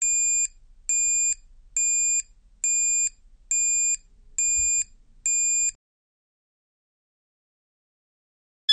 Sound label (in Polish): ton